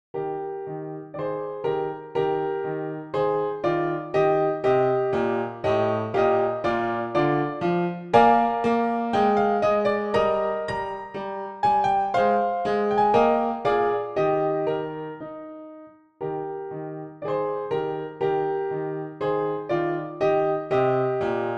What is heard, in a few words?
Dance PianoTeq Bechstein; Dittersdorf 12 English Dances